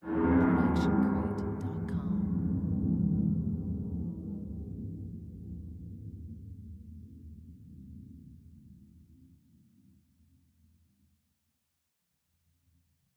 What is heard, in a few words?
Creepy Low Grumble; SoundsCrate